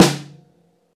subby snare drum sample f key; snare-drum-samples

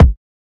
short reverb bass drum one shot c sharp key; kick

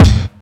short steel kick drum f sharp key; kick drum samples

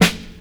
short snare drum sound f key

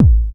sharp bass drum single hit a key; Mono; kick drum samples

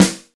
sizzle snare drum sample F sharp key

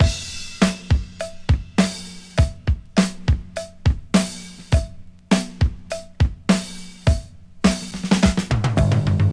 103 bpm breakbeat f key

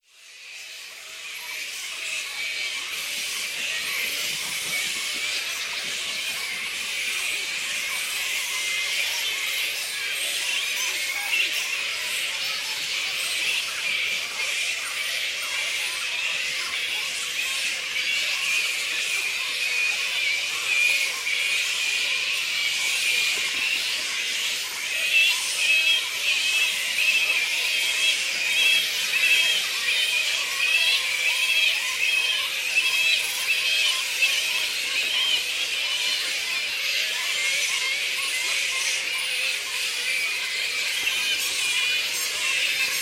black fruit bats - pteropus alecto colony squeaks

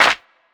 clap single shot d sharp key

